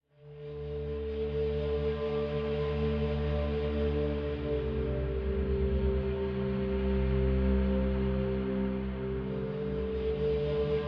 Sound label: ui pad; chaguan; Identity V